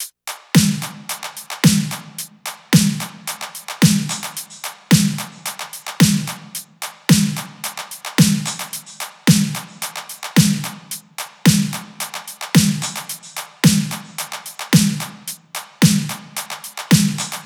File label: top 110, Drum Loops; SD MIDNIGHT Sample Pack; Slate